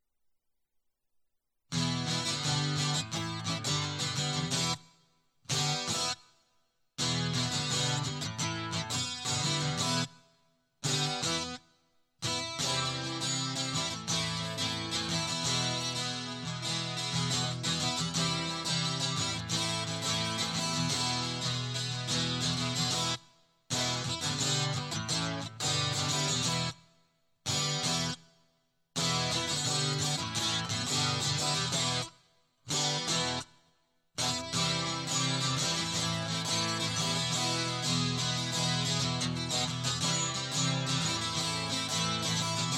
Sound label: Guitar Loop; Meteoright